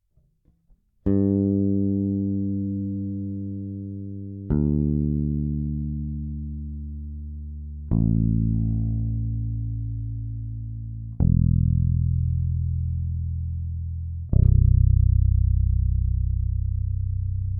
Phil Jones EQ E-Bass Mid Regler

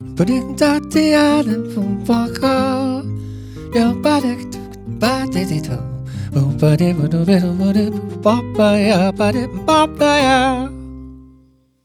Sennheiser MD435 Stimme Piano; MD Serie

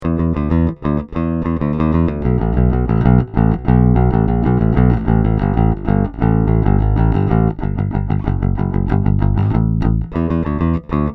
Music Man Caprice Plektrum

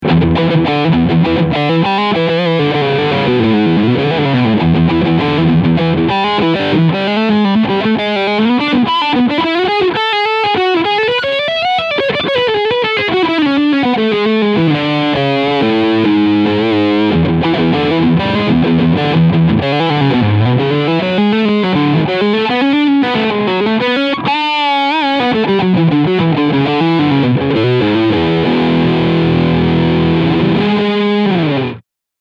PRS McCarty 594 E-Gitarre Leadsound Steg Humbucker

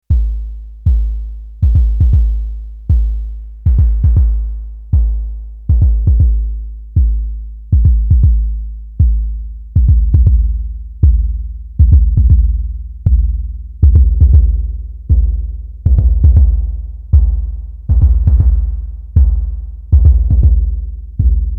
Dreadbox Drips VCO Bassdrum mit VCF VCO2 Noise

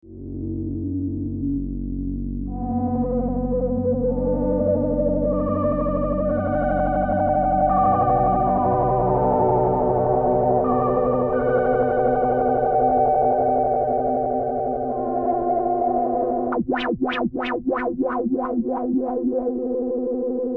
wimmer flaeche

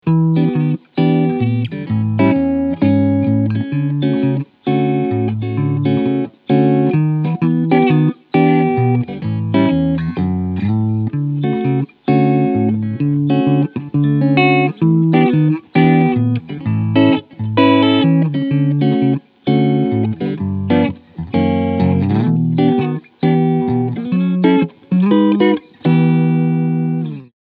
PRS SE Hollowbody FR Fire Red Cleansound beide Pickups

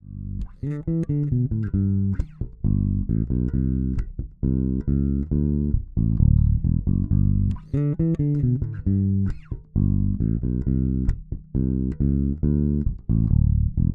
Sadowsky NYC Modern Both Pickups aktiv

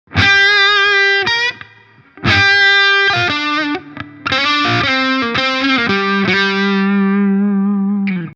G Mollpentatonik nasty

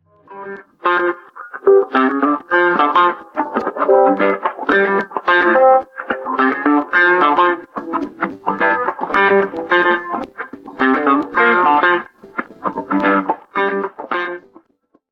nur Gitarre; Steinberg SpectraLayers Pro 10

